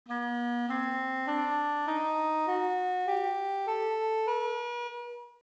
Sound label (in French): rahat, La gamme rahat al arouah; GAMME EN MODE DE SI = OURAK